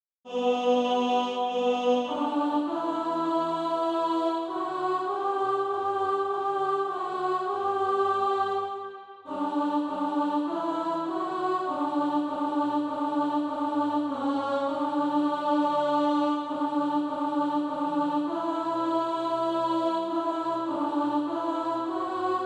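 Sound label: alto, Practise Music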